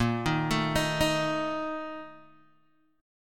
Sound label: Bb Major 7th Suspended 4th, Guitar, Open G; x,3,1,2,4,1; 4D,4G,5D,5G,5B,6D; arpeggiated